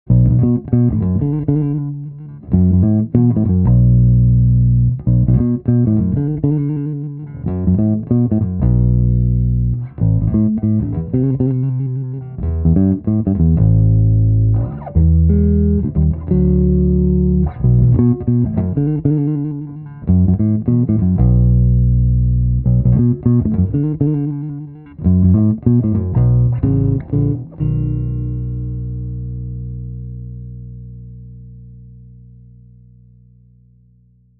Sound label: Little Bass Thing Twiddle